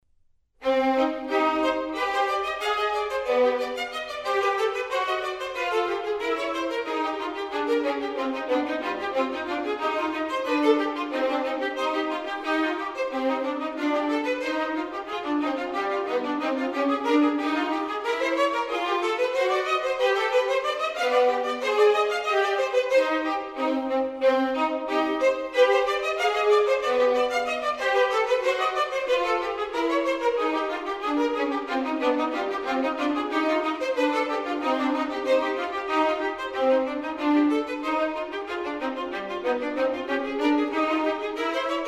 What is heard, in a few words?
Canon 1 a 2 Canon cancrizans; J.S.BACH; The Musical Offering